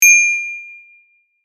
bicycle bell meme sound effect

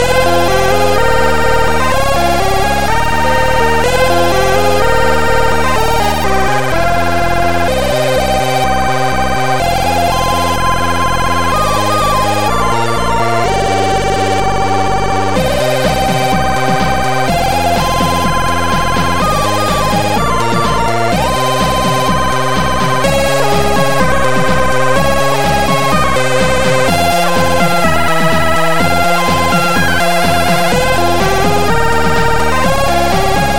KEYGEN MUSIC RINGTONE; DESK WAY

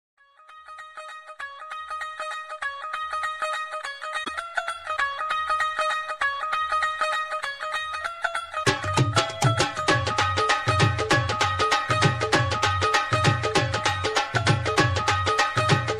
punjabi music sound effect

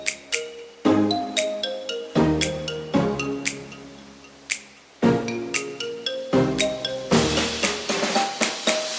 VIVO IQOO Z6X RINGTONE

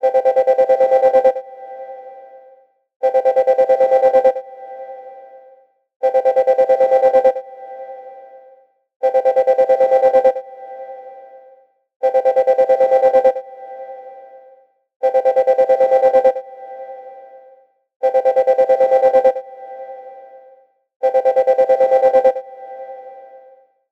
PING ALARM RINGTONE

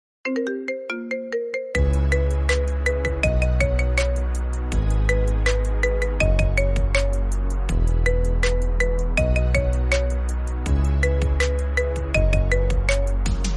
IPHONE RINGTONE

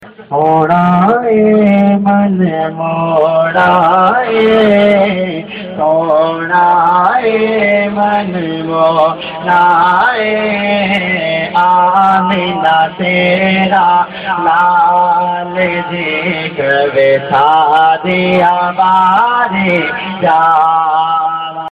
NAAT SHARIF RINGTONE

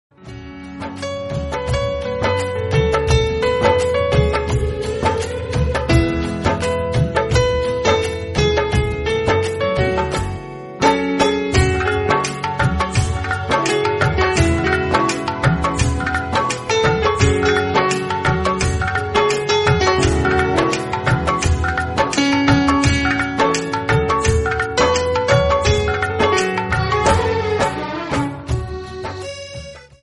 FLUTE RINGTONE

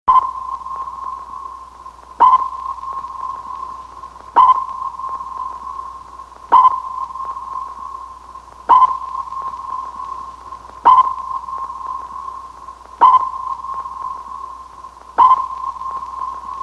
SUBMARINE SONAR PING RINGTONE

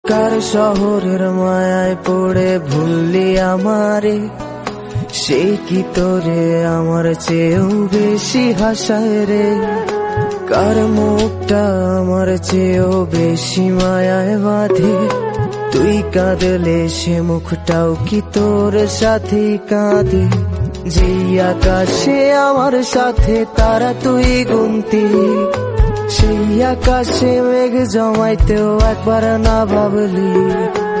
Bengali song ringtone

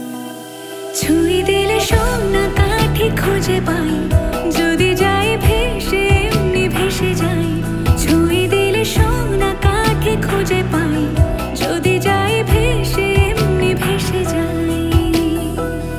FEMALE RINGTONE

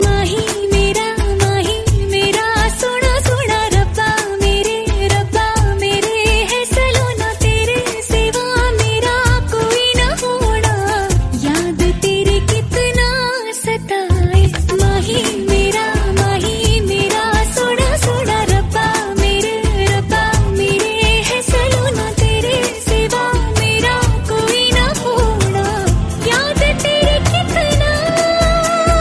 FEMALE RINGTONE

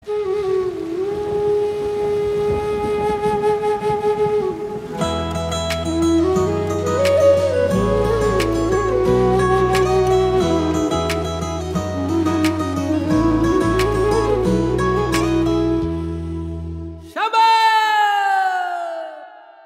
FLUTE RINGTONE; BHOLE SHANKAR

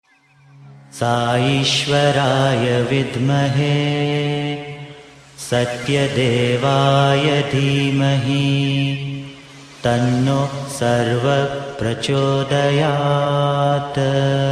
MALE RINGTONE

